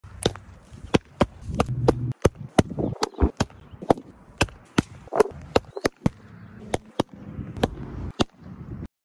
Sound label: baseball